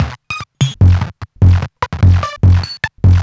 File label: Modular Loop, Modular Percussion